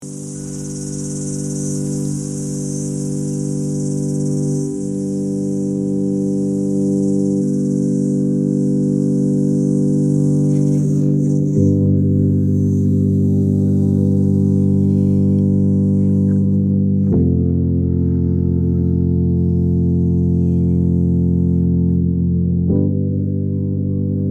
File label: oceanic feeling instrumental